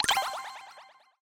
number Get gauge; attackonball

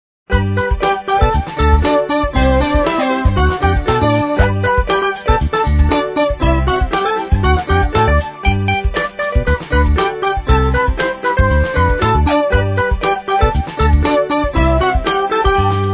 music; fubag

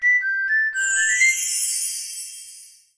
start level; tacit

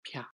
crash; plane

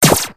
win; fctank

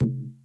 toss; attackonball

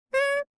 monster; petparty

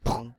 collide

